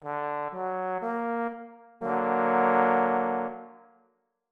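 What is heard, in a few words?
bass triad augmented D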